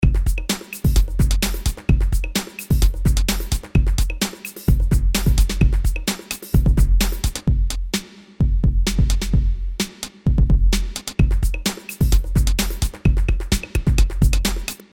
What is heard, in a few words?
blips